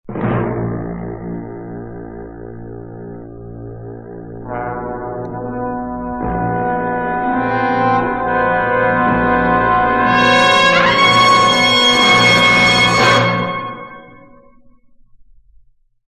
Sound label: wave music